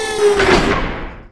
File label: Liftrel